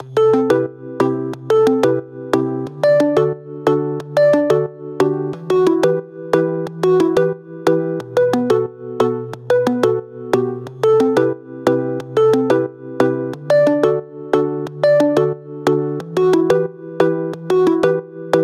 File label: Future Bass Chord Loop - 180 BPM E Min, synths